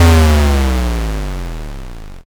explosion

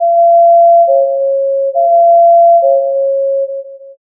ambulance

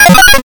glitch

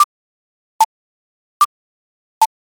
ticktock

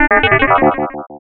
alien signal

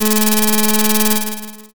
slot spin

